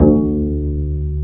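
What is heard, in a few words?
String, contrabass pizzicato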